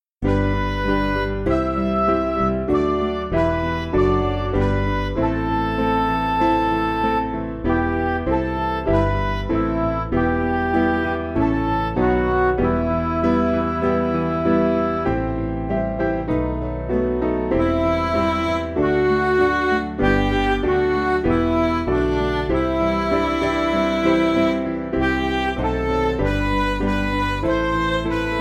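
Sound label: Music Backing Files for Churches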